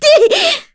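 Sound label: Vox Attack, korean; voices; heroes; FreyB; kingsraid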